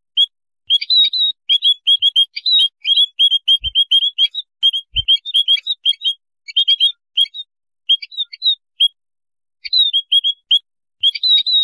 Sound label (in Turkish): beyaz basli mannikin otusu; serinofil